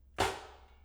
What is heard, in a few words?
table foley; DoomRTX